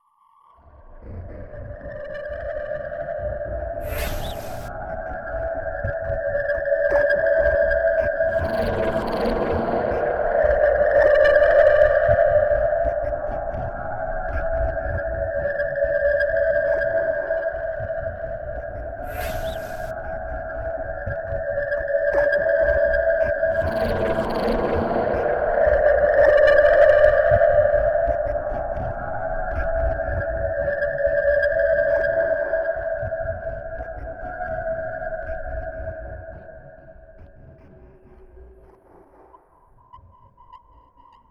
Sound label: end ambience; Half Life 2; Episode 1; outro